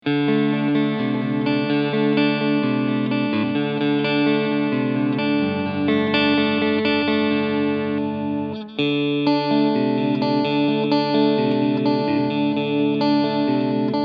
Kramer Guitars Focus VT211S Cleansound Position 1 5